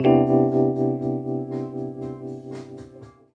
HEY, Roots Disco; USB Soundscan vol.32; AKAI

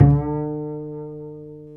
Bass, Roland

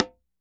Drums Percussion Congas

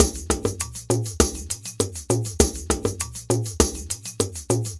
Ensemble, Latin Percussion

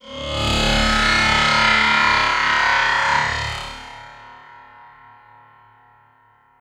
Electronic Pad; Sonic Foundry (Sony Creative Software); Electronic Point Blank

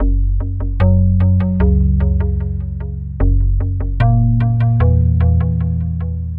Pluck Bass Echo, Synthetic Pulses

